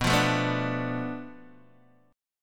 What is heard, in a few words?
B Minor 7th Flat 5th B Guitar Open D